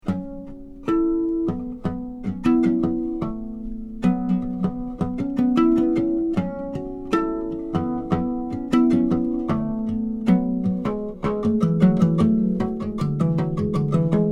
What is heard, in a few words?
Harmonics